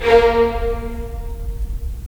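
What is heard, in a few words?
strings violins, right; Roland - LA Composer vol. 4; 90 s Sample CDs; Violins Sh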